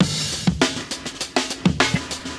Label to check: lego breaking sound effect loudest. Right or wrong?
Wrong